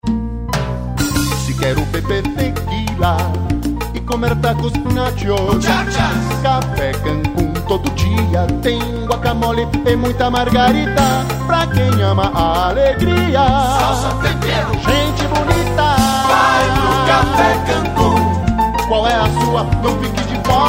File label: jingle